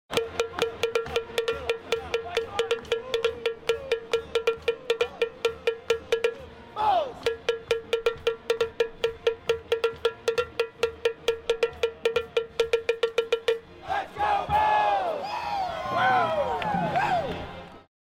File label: Cowbell